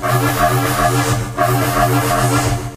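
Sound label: sphere hack loop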